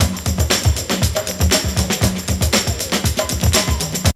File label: break; Zero G - Man Machine